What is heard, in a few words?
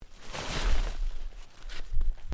Body Movement Snow Move; Body movement foley to simulate falls and deaths; Human